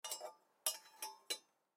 Sugar Cube Holder, Sugar Cube Holder Remove Lid Antique; Food; Household; Blastwave